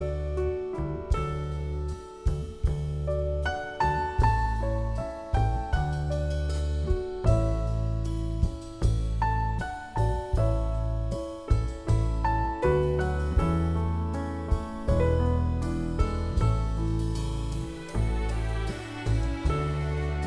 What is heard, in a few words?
piano